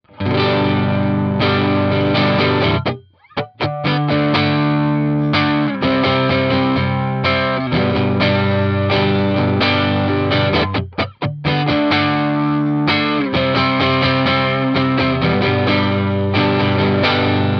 neck amp dirt, pickups

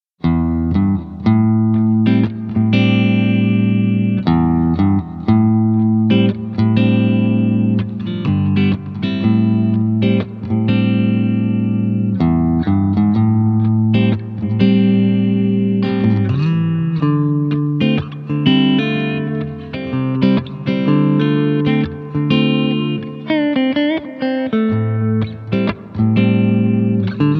Bridge Neck Clean, pickups

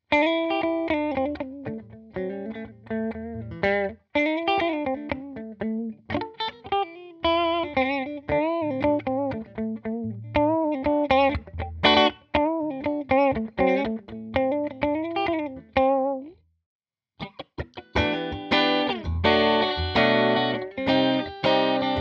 Middle Clean

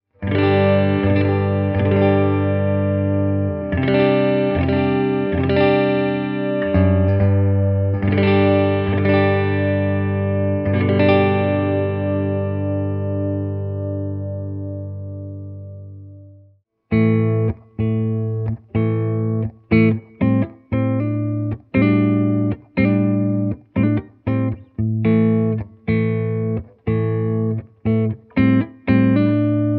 neck clean

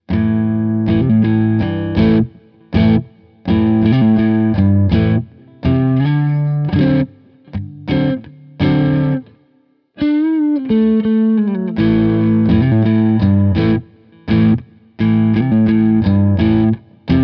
Neck Full Dirty, pickups

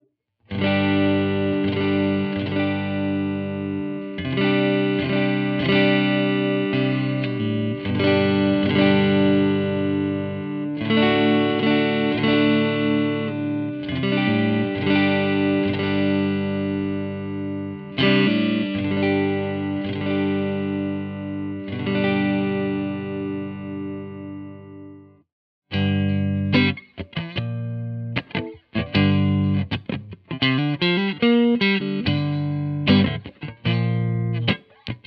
Turk Neck Clean, pickups; Revel

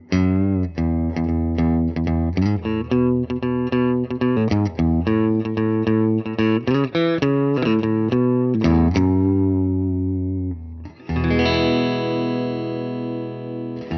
middle, custom pickups

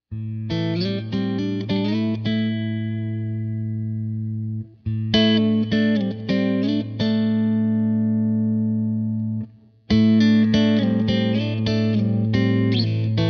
middle, custom pickups

